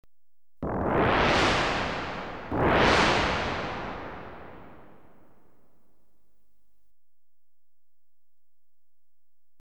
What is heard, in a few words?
2 Crashes, Electronically Generated Sounds; BBC Sound Effects Library